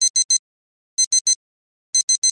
Watch Alarm Beeps Ala

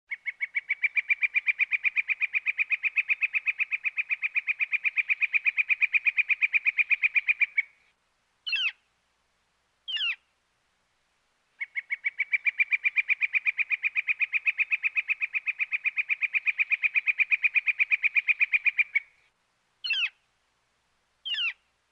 Bird, Flicker; Rhythmic Chirps, Close Perspective

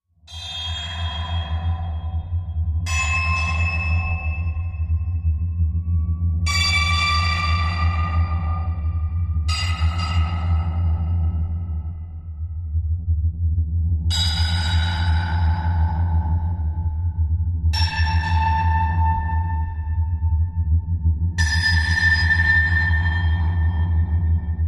Anviled Machine High, impacts

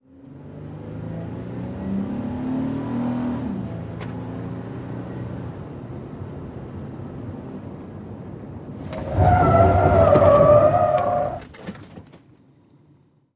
SUV 180 Skid Int

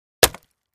Axe Wood Crate Palett

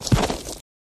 Horse Hoof Hvy Trudgi

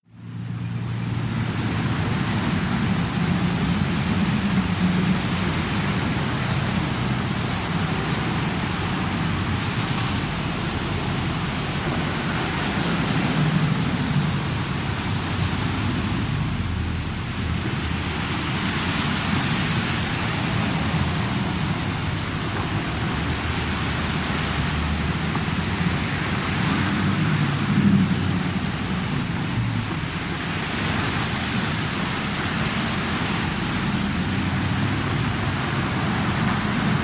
Slw Rush Hour Traffic, big city

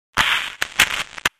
Static Bad Connect, communications

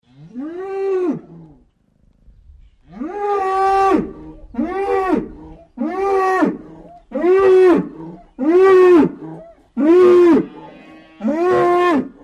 Bull Bellowing; cows

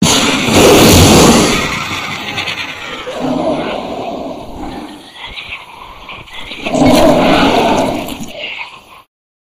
Monster Action 4

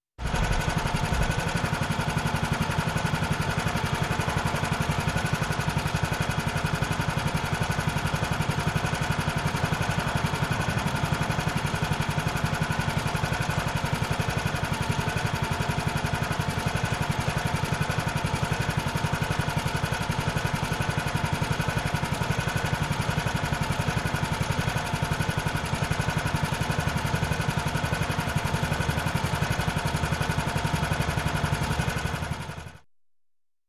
Lawnmower Engine

